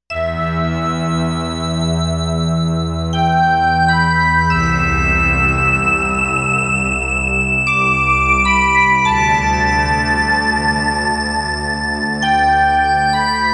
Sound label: Astro Lead